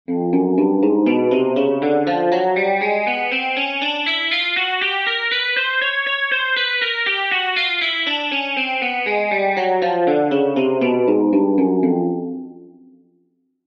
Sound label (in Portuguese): Exercícios Guitarra 120 bpm